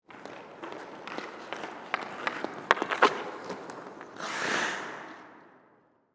Hockey Approach, Shoot, Puck, Soft; Sports

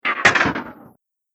Poltergeist On The Move, ghost; Ghost Sound Effect; Sinister, Scary, Spooky Or Just Silly

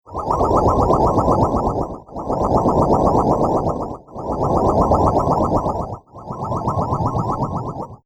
Alien Life Support Chamber Loop; Alien Sound Effects; Sci-Fi Sound Effect; Andrew Fleming

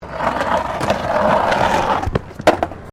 Skateboard Grind; Skateboard sounds; Sports; Skateboarding

